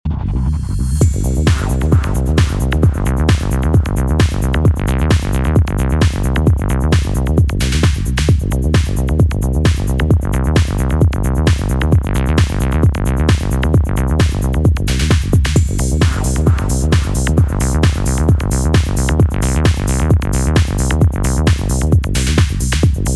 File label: Wah Wah y Phaser